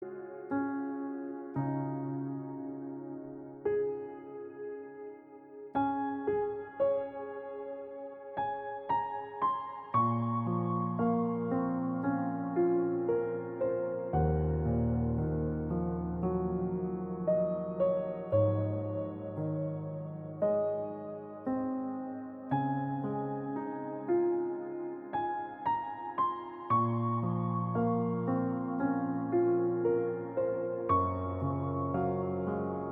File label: Baby Lullaby